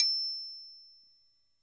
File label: TRI MUT, PERC; Sampleheads; NYC Percussionworks; 90 s Sample CDs